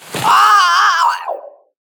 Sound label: Messenger Raven Death